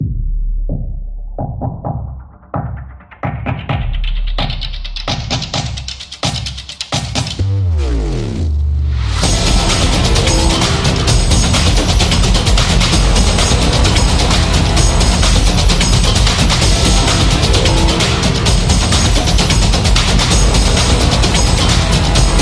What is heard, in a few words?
Percussion Cinematic